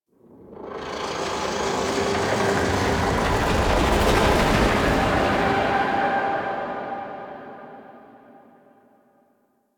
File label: Pulsating Whoosh; Warframe; Ambience; Entrati